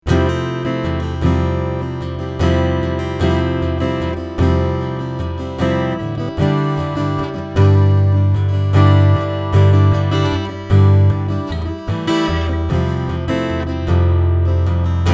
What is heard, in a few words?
Fender AM Acoustasonic Strat